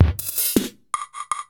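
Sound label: percussion, ambient, 160; eJay; Drum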